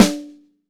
12 SNARE, MAPLE SNARE

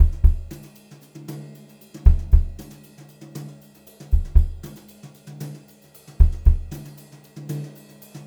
HOTROD VARI, Brushed Drum Loops

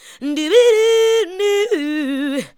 ADLIBS 90; Ueberschall; Jam Box

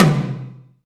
DM5 Tom Hi, Alesis DM5 drum machine

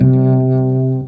DM BAS, Dance Mega Synth; Best Service

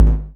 KICK R; KICKS; AKAI; USB Soundscan vol.20 - Fresh Disco House I

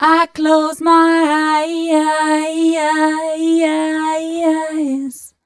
f mel a flat, fem Vocals

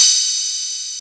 Atlantex Music Percussion Computer Cymbal Choke

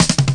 Rim Shott Cut, Drum Loops 166.5; Zero-G Jungle Warfare 1, 2 & 3

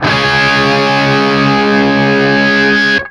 5TH C#3, Guitar; East West; Steve Stevens Guitar Samples Collection

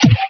Trap Snare; Sonic Foundry (Sony Creative Software); Esoterik Beatz; Trap Kit

